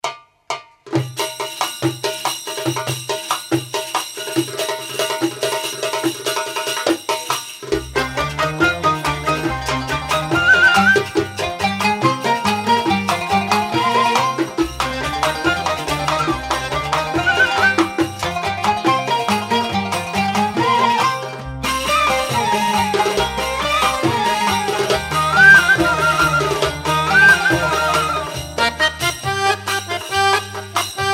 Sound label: Takht